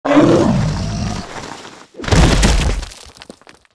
Fire Titan die

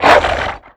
Snowy Wolf Damage

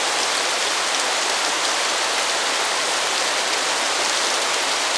Waterfall

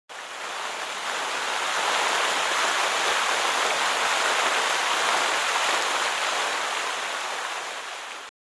Fountain

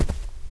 Caiman Junior walk; Seer; GamigoNA

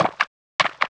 Mole run

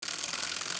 Tandem Bike Walk; Seer; GamigoNA

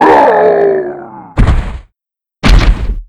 Angry Yeti Die; Seer; GamigoNA

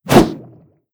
Siren Wave Attack; Seer; GamigoNA

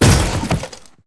Die; Acon

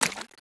Frog Walk

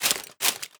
Paladin Run; Fargels; Gamigo

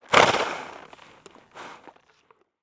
Deer Sled idle; Seer; GamigoNA

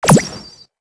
Slime Main Root Die

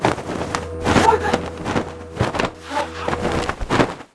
Harpy Die

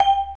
Item Put Ring

